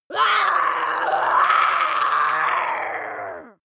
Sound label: witch scream scratchy long